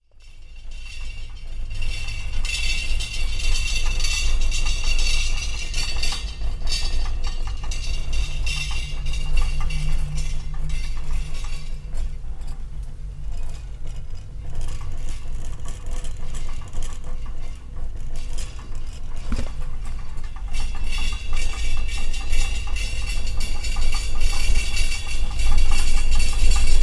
kitchen

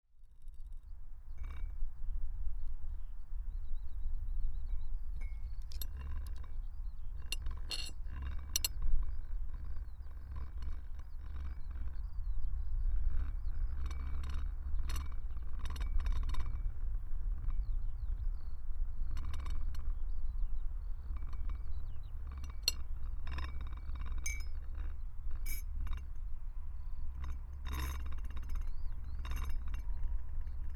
skylarks wind ground teapot

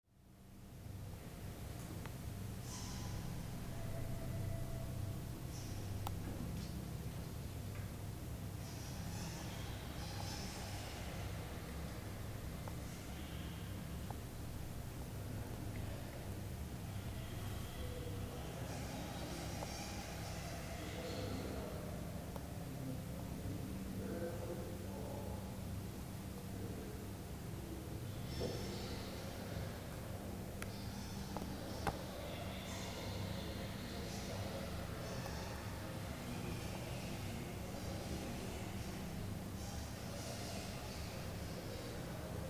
fruit bats chatting

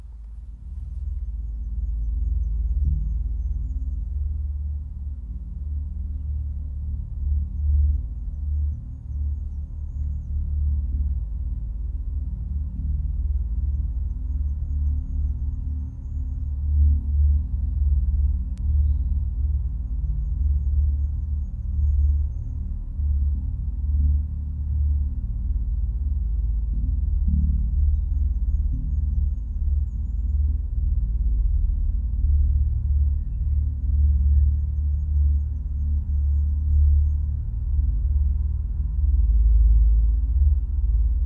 low frequency

